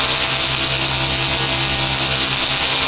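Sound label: anchor laser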